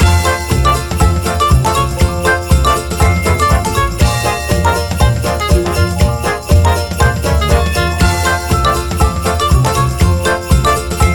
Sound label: big win; santa vs rudolf